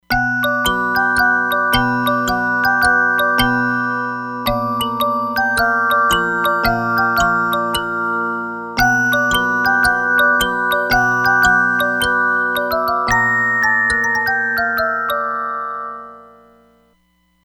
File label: Kalimbells, Roland Fantom XR